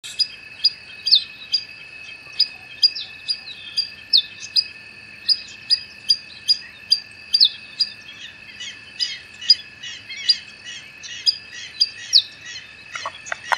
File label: Solitary Sandpiper, Tringa solitaria; shorebirds rails